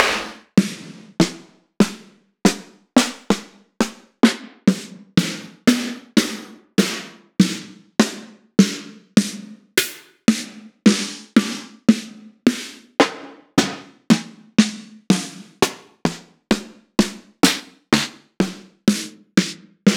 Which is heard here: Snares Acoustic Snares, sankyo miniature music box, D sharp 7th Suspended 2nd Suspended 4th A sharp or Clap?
Snares Acoustic Snares